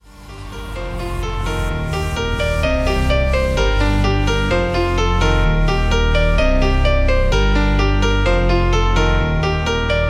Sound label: Future House; Remixfreakz